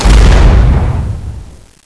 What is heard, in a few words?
auto boom; de austria